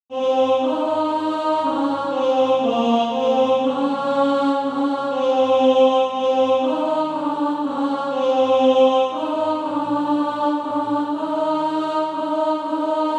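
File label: alto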